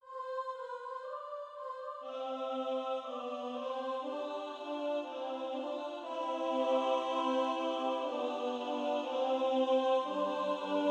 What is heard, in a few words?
Canon by double augmentation; Taylor Edward